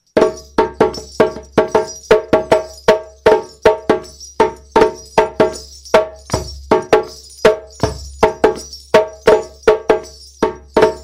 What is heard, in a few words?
Kuku, djembe